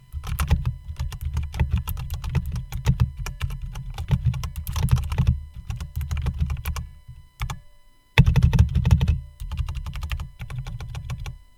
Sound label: keyboard sound; epomaker th80